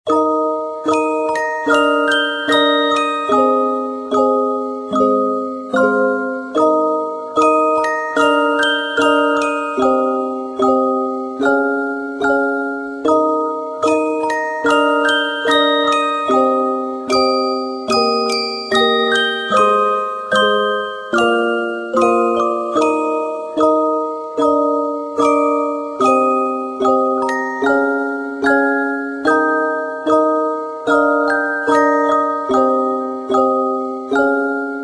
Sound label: Music of Lullaby; menherafflesia flowering abyss; android